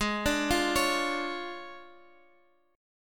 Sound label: D Minor Major 7th Flat 5th G sharp